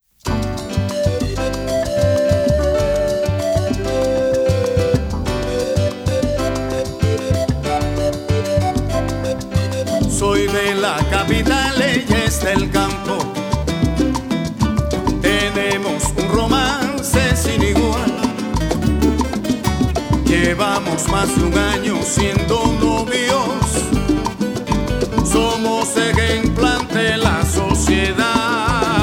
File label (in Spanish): Salsa